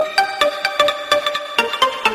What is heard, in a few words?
ring alert; reggae